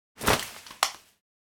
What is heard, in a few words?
Small umbrella close